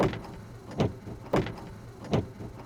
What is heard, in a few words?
Wiper Washer; SIMUZENSOUND